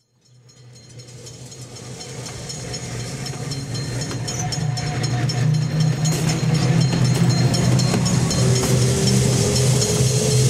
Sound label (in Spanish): Tranvia